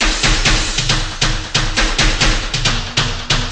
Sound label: 136 bpm, background beatings, drum loops and breaks